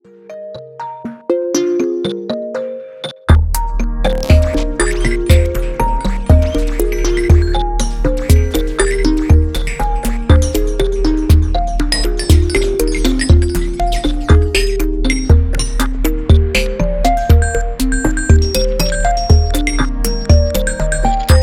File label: metallic